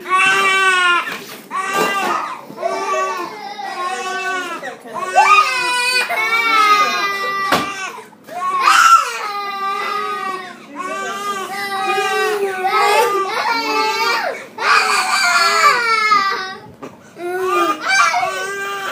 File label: all 3 crying